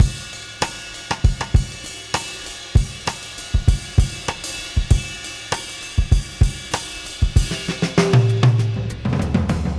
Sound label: Beat Loops, 098; Zero-G; Monster Beats